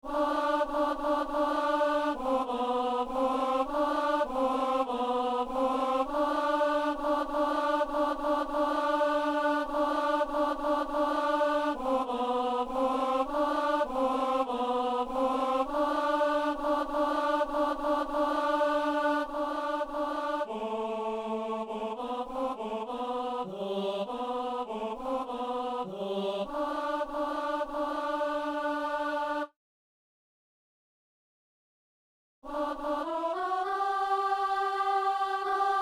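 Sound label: tenor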